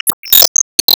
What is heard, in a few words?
Bonus Balloon; Se Sys Bonus Balloon 7